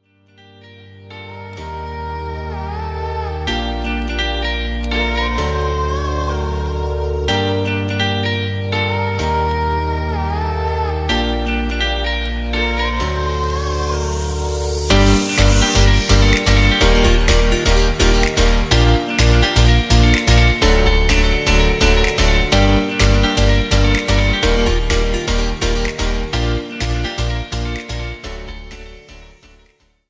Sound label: Guitar Instrumental